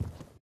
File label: foam floor walking